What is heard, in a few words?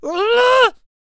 Death Vocals; Shouter